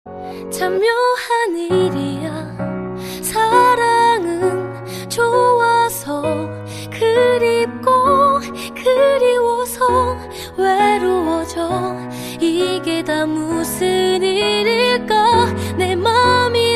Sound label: acoustic